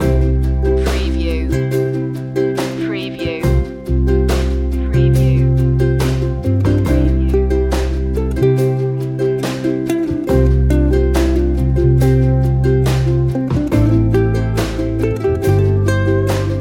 acoustic ballad

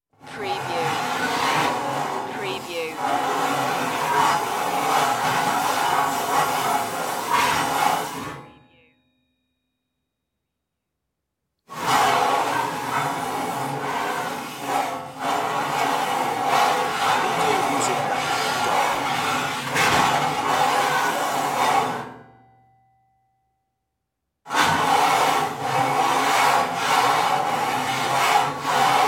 metal surface slides

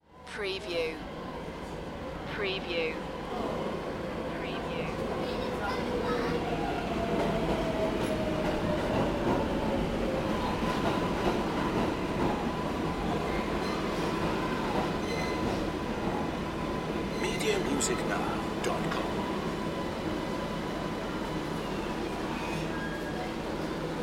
Subway Station

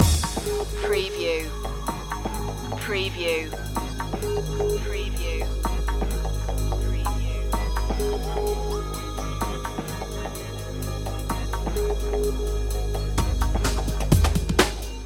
funk loop

